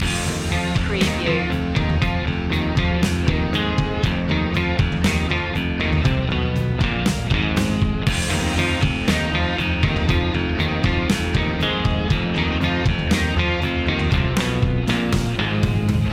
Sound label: escape loop